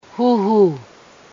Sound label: cough; matukar